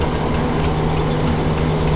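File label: door warehouse loop; doors elevators; Star Wars Obi-Wan; XBOX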